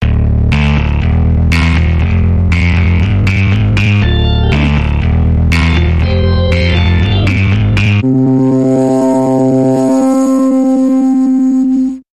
Buzz In Rock Bumper Reversed

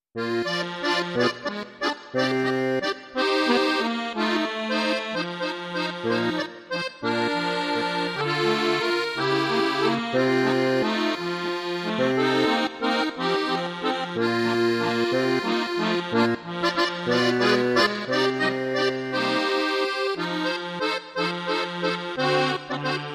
Bavarian Oktoberfest No Tuba

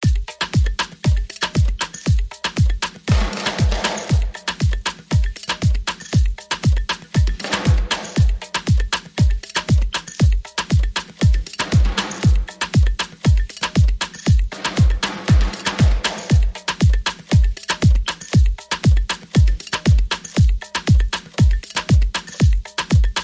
Percussion and FX

